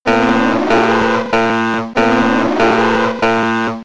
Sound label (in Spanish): alarma evacuacion; tono de llamada